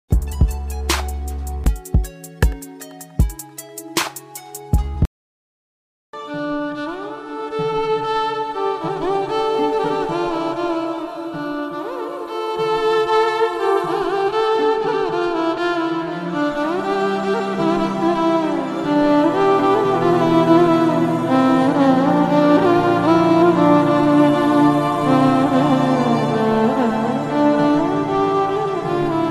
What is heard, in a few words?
violin malayalam